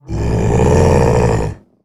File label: alert, male zombie; nmrih; zerosiki; slender